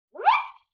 Wind Whistle Zip

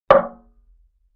Barrel Hit Medium; Vintage Cartoon; Drums & Percussion

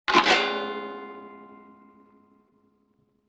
Detuned Guitar Strum; Vintage Cartoon; Musical Elements

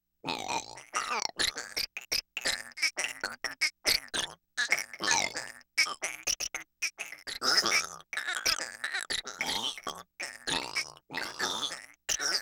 Cybertronic Worm, Vocals Humanoid

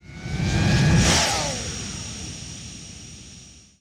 Fast Rev By; Sound Design

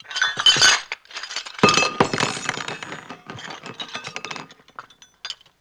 Pickup Dishes Tablecloth; Vintage Cartoon; Foley

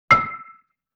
Xylophone Chord C; Vintage Cartoon; Musical Elements

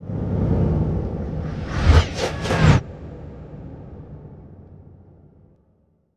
Whoosh Synth Composite, Horror; Sound Design

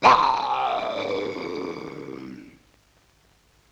LoFi Synthetic Lion Roar

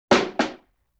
Rim Shot Recoil; Vintage Cartoon; Drums & Percussion

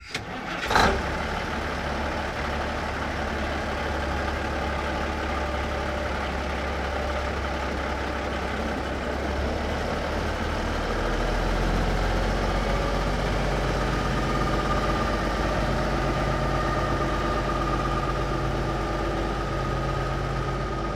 1954 Big Rig Ext Start Idle Reverse Off; Period Vehicles

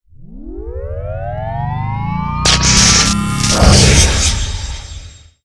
Power Up & Blast, Sci-Fi; Sound Design

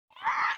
LoFi Synthetic Bird Caw

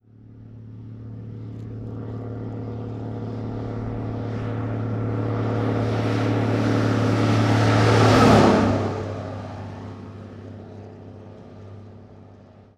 1954 Big Rig Ext Fast By; Period Vehicles

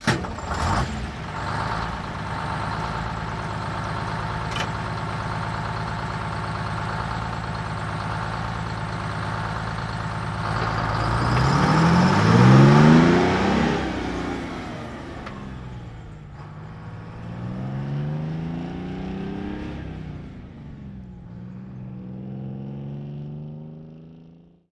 1948 Diesel Truck Ext Start Idle Fast Away; Period Vehicles

